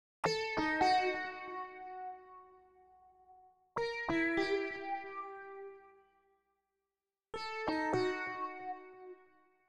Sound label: Sitar Melody